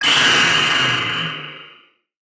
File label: attack; Barotrauma; Legacyfractalguardian